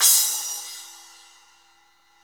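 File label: cymbal, Roland; Club-50 - Foundations Roland; Ext.Snare 1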